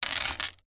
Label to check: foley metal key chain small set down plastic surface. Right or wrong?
Right